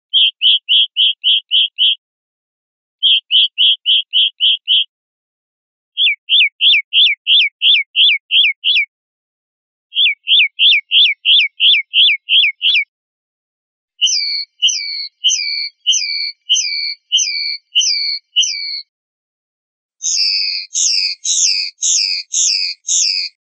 rep golpes, pardillo

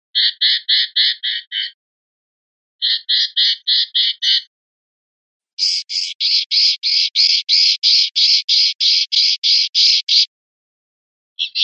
final jases, pardillo

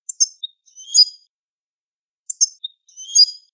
quejas comp

